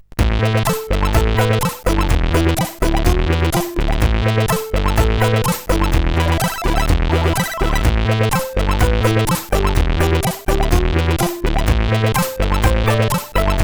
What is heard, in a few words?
sid CSG8580R5; IQ Arne and Volvo part 3